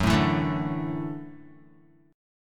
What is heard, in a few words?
Gb Minor 7th Sharp 5th, Guitar; strummed; JGuitar